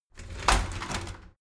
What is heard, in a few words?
shower slide door inside open heavy halfway